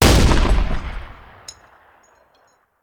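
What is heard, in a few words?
Artillery Cannon Anti Aircraft; Sound Ideas; Guns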